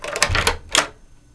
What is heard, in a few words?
unlock